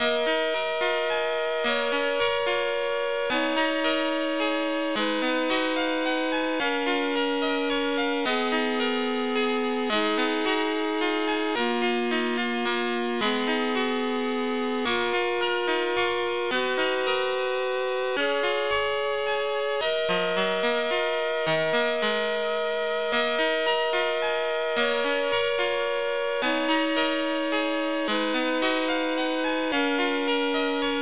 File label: Soft Prayer; Riviera: Yakusoku no Chi Riviera; WonderSwan Color